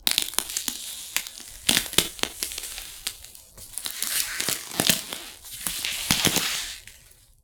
crack

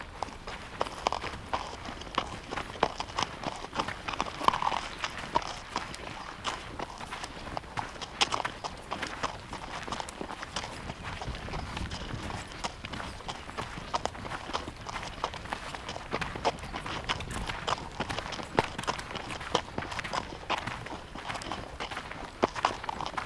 the mountain pass, ambience

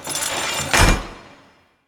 close heavy, doors jail

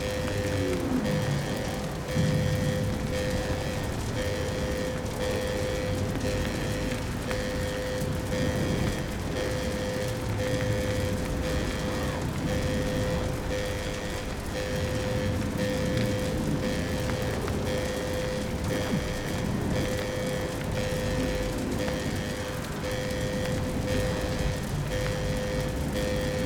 doomed shuttle, ambience; sf2e; guilt of the grave world

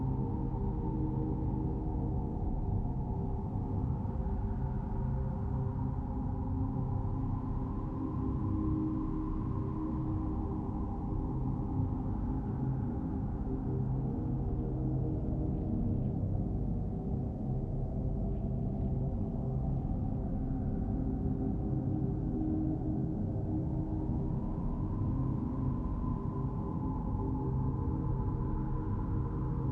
ambience; sf2e guilt of the grave world

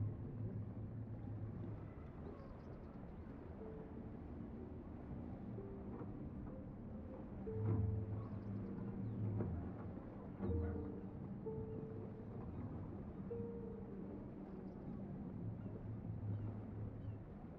indoors, ambience; greengold

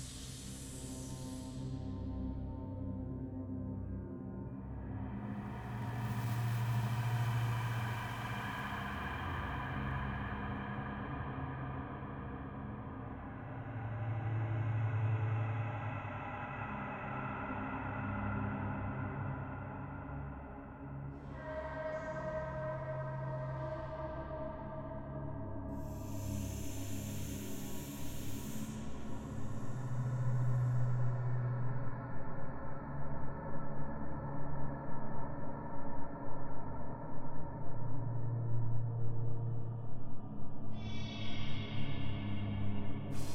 temple, ambience

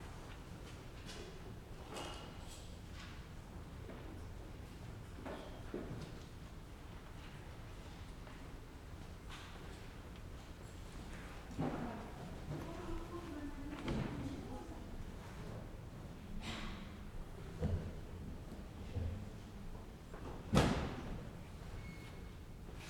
Quiet Crowd; fa battlemaps

